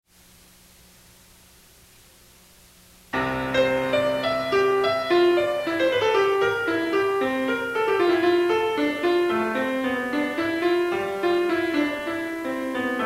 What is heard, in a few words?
Prelude and Fugue No1 in C Major, Piano; JS BACH